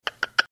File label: tongue clicking sound